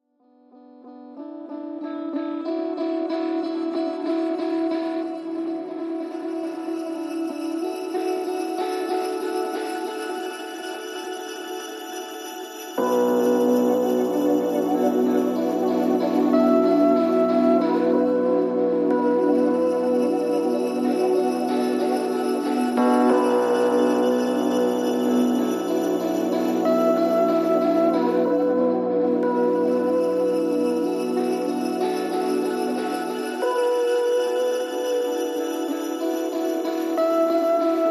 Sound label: Ambient Mix